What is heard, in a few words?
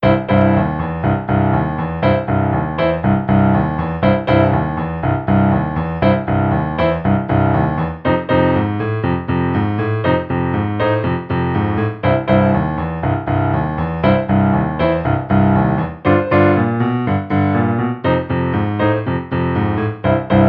blues ringtone